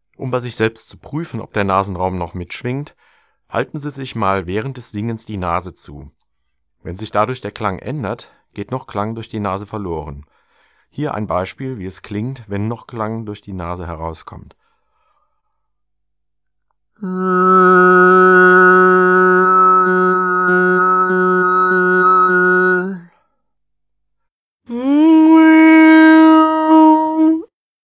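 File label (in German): Nase zuhalten während des Singens mit und ohne; Buch Oberton Singen CD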